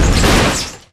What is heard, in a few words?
vehicle impact medium, v8; Source Engine; Portal 2